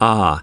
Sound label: "ah"